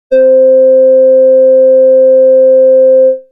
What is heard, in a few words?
Solar-Flute B